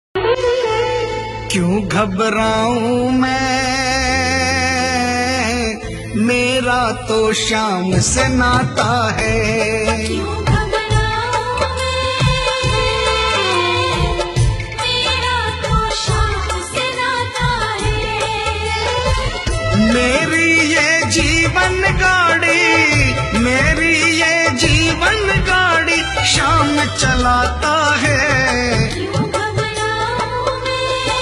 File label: Hindi Bhajan Ringtone